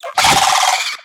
Swamp Jumpers Alarm; We Are the Dwarves

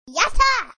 exclaim; Chibi Knight

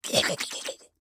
startle

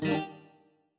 Instr Guitar; Backpack Hero

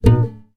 Guitar Bad; serious sam siberian mayhem 2022 pc gamerip; Easter Egg

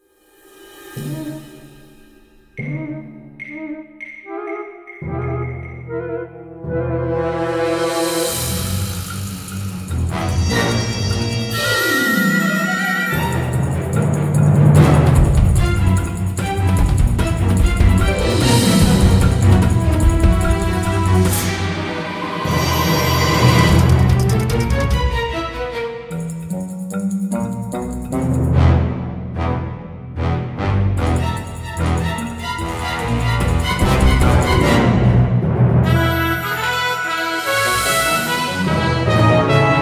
Cinematic Street Fight; Jack Keane

